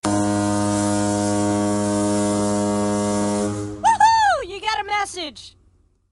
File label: Siren Msg